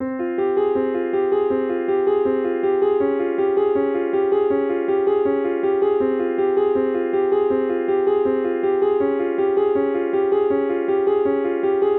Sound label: Piano Loop